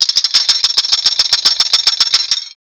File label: sound of heavy metal part, mechanical